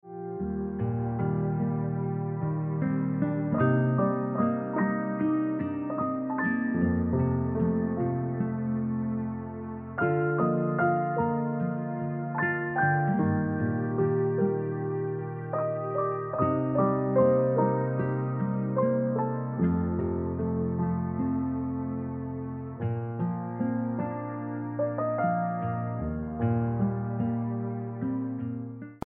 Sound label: Ringtone Piano Music